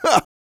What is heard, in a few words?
YELL, MALE HUMAN; Spectrasonics Vocal Planet